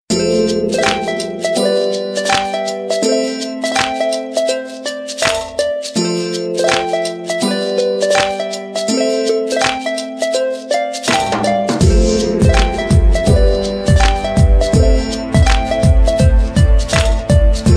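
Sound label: Ringtone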